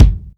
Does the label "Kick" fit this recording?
Yes